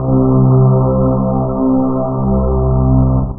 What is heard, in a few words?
Humm; AMIGA; hanger